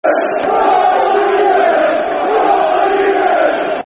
Come On You Red's Football Chants (Soccer Songs); Stoke City Scfc

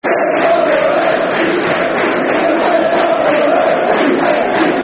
Super Jim's Barmy Army, Football Chants; fanchants; Ipswich Town Itfc

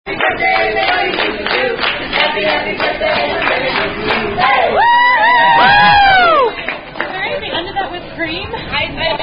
Happy Happy Birthday

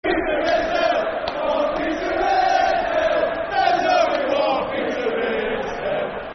Football Chant (Soccer Songs), Port Vale; One Peter Ridsdale; Port Vale Pvfc

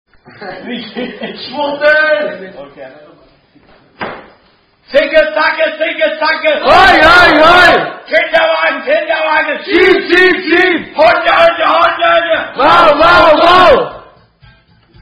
Ziggy Zaggy Oy Oy Oy (Long Variant In German)

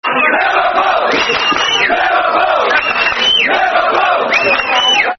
Liverpool Clapping, Football Chants; fanchants; Liverpool Lfc

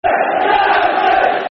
Football Chant (Soccer Song), Man United

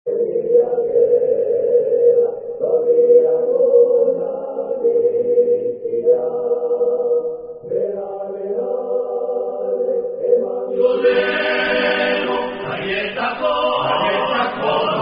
[French Rugby Song]; Sing For Rugby; Chantez Pour Les Autres

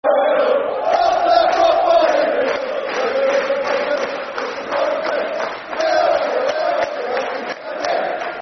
Football Chant, Soccer Songs; E I E I E I O Scunthorpe; Scunthorpe United Scfc

